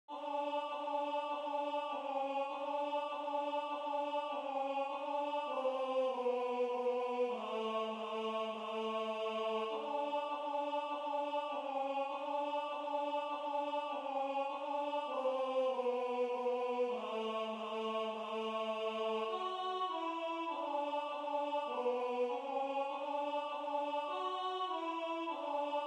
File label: Alto